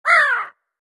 Parrot Stomped; gamerip; The Fancy Pants Adventure World 3

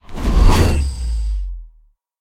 Whoosh Loose

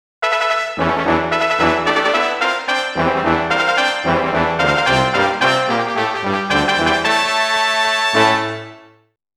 Quite Eager Fanfare; Monster Farm 2

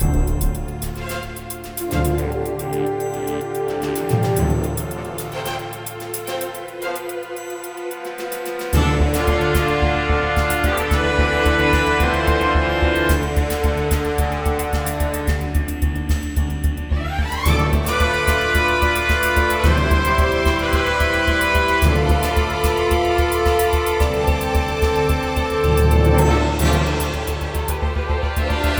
Intense; Azure Saga Pathfinder; iOS, MacOS, Switch, Windows

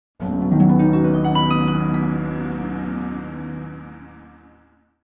mysterious harp and gong spells; Ki11er Clutter; Switch gamerip

